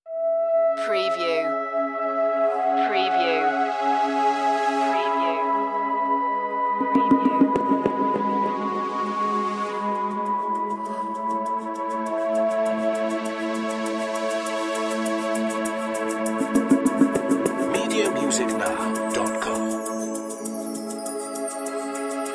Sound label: ocean flute loop